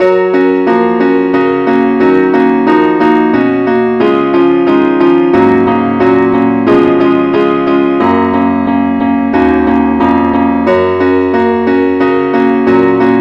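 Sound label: keyboard only key f sharp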